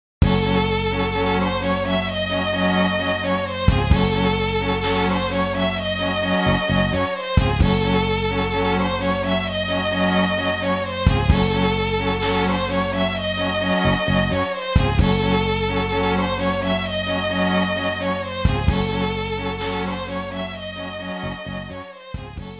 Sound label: Stage Opening; Slash; Sunsoft Collection